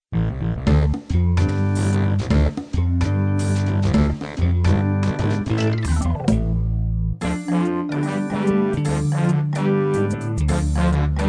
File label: song backing